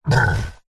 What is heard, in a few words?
horror monster short breathy sneeze or quick exhale